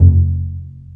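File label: String, contrabass pizzicato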